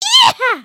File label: excited, emotes